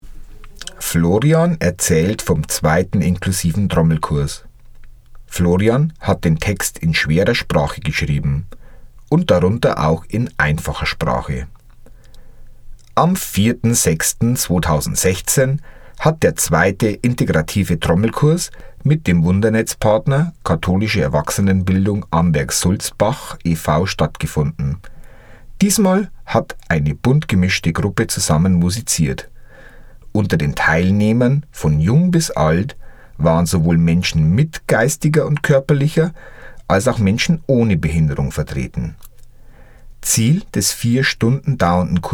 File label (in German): Trommelkurs